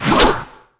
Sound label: katana, samurai